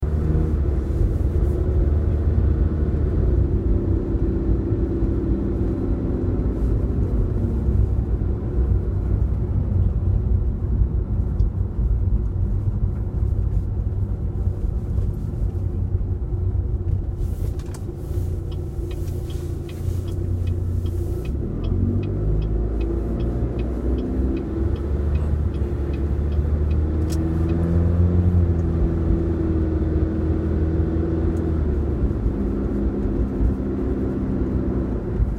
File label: blue coupe normal